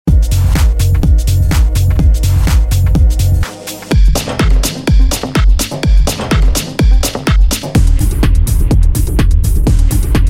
subterranean techno

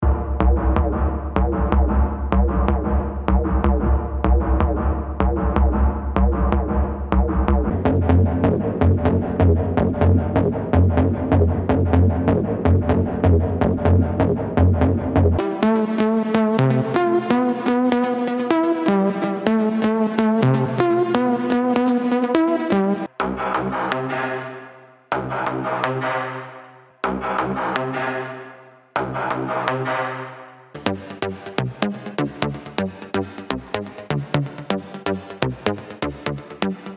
huge techno synths

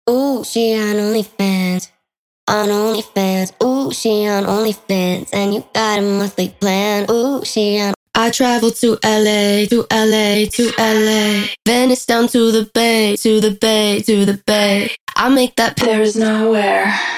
tech house vocals; ultra tech house vocals

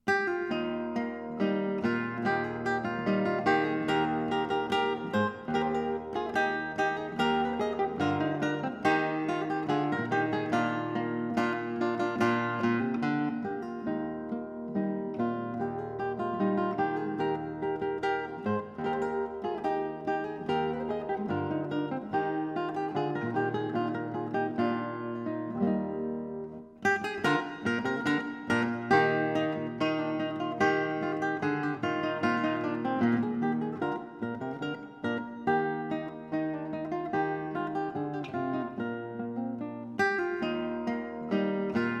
rondeau arr for guitar; g de chiaro

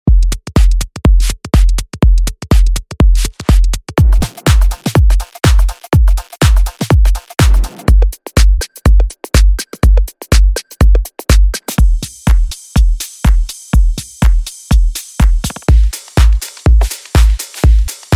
progressive techno

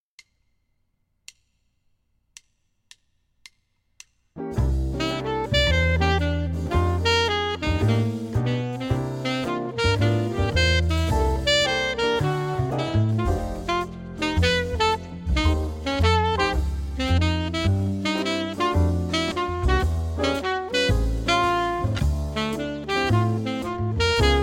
alto sax blues

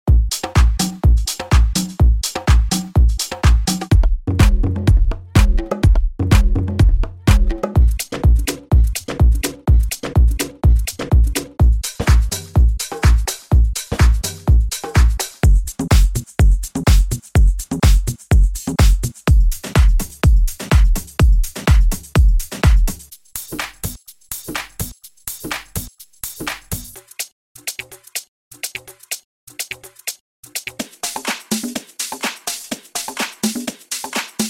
tribal house; tribal house revenge

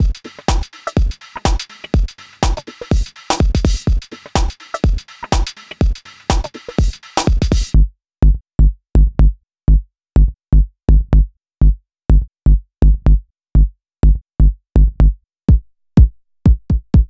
minimal essential drums and percussions

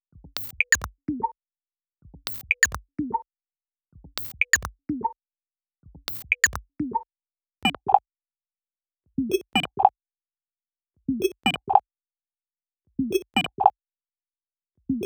minimal house sequences

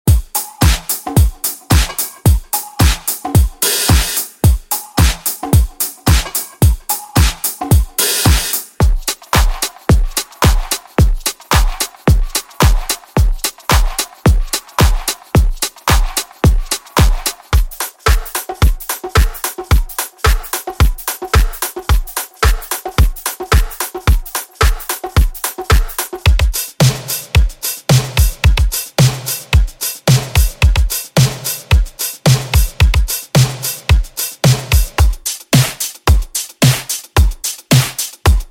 nu disco drums

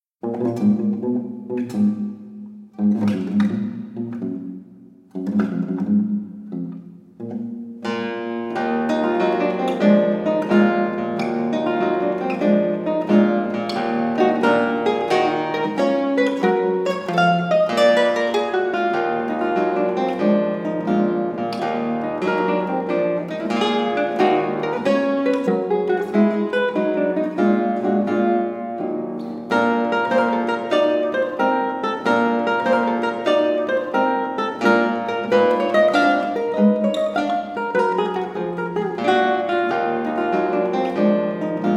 funeral march of a marionette for guitar; marche funebre dune marionnette; arr g de chiaro

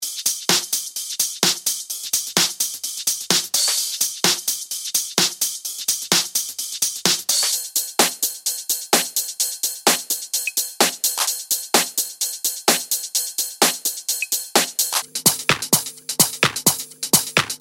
techno top loops

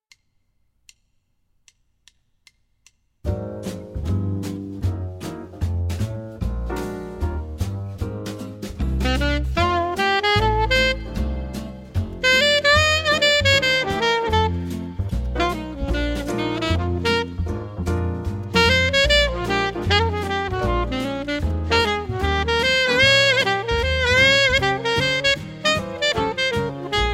alto sax medium swing

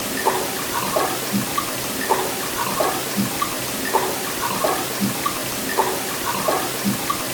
wood knocks; bena 5am 2023

